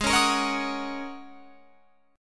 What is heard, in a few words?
Ab 7th Suspended 2nd Suspended 4th down Guitar Open F; strummed